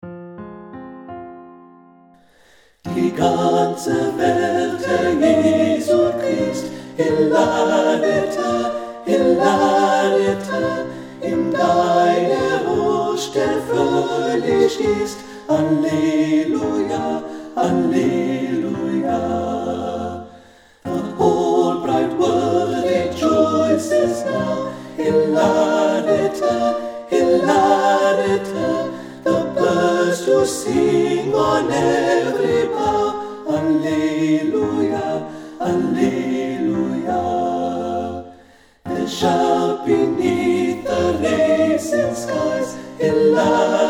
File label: Hilariter SATB; st barts